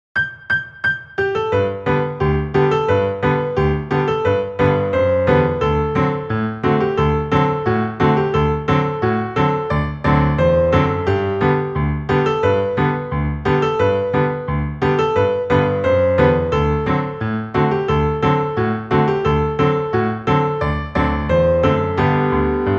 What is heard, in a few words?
Zauberklavier 176; Uebungs-CDs; Playbacks; Polly Wolly Doodle